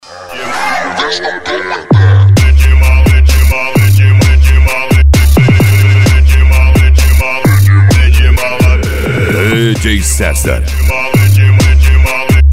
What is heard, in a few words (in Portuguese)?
som car; especial na balada